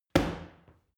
paw kick hard

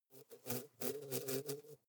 hornet hum

